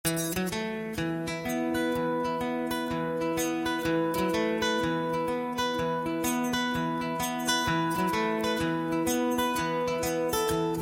(A) No Backing Vocals

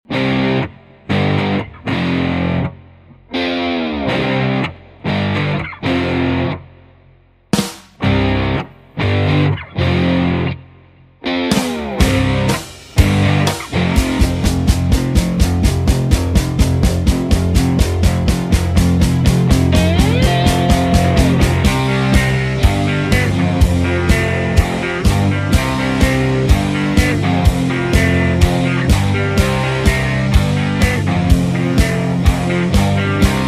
(C) No Backing Vocals

